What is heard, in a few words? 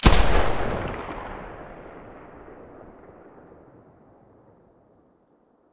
muzzle fn2000; stereo